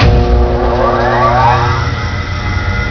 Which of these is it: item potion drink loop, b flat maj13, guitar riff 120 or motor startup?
motor startup